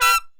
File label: trump tight stabs chord